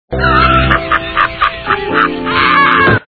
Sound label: laugh; courage